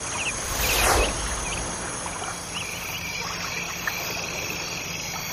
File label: Winter Peak Hideaway